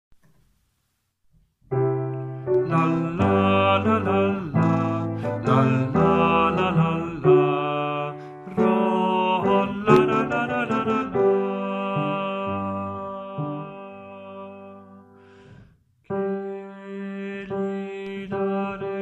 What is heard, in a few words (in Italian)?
El noi de la mare baritono